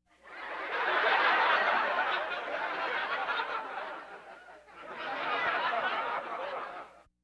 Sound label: Laugh tracks